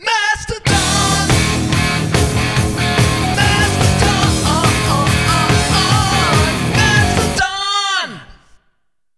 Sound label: Jingle; Mastodon